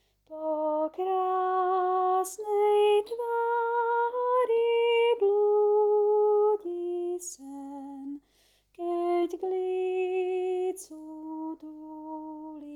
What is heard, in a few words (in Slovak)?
Madona s dietatom Sopran; spevokol